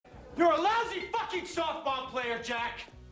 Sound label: softball